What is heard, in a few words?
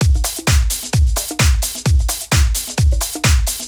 Drum Loop 130, Downtown House; Drum Loops 130bpm